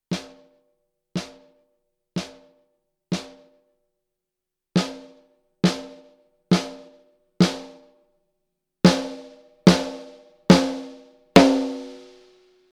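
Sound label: Gretsch Bell Brass low, snare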